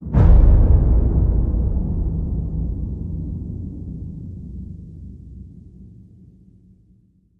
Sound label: Hit Low Combustion